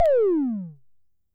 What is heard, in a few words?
tom, Coron Drum Synce DS-7